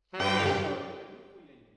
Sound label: MKH AB, piano